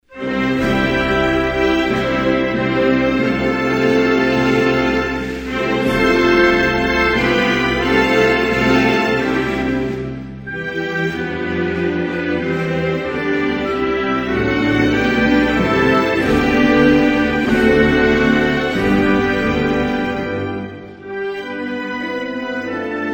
Danemark, Hymnes nationaux

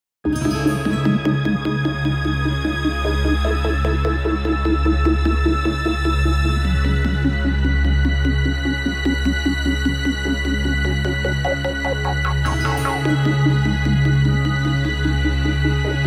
Agent secret Minimalist; Jingles medley

